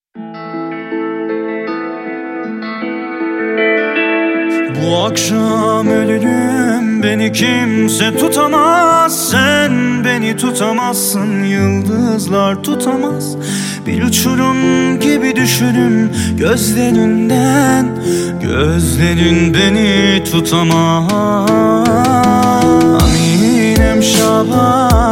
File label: Relax Persian Song